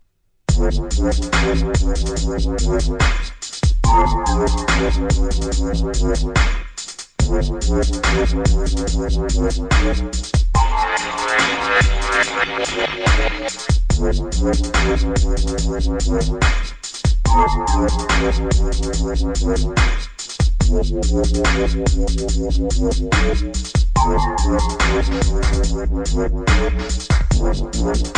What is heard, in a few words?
dubstep, ringtones; electronic